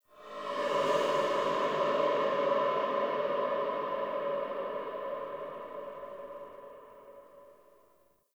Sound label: Hub Texture A, Ambience, Dungeons, Under City; Silent Rose